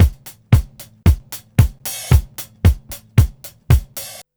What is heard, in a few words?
beat, Roots Disco; USB Soundscan vol.32; AKAI